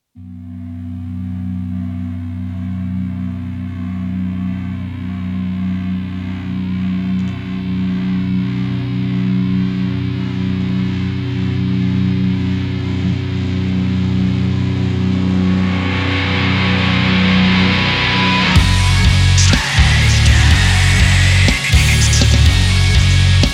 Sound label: music trailer